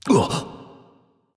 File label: nv breath; smite; xbalanque